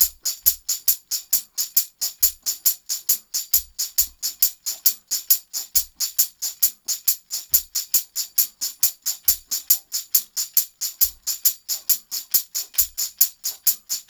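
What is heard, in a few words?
TAMB, tambourine; Drumdrops In Dub VOL-1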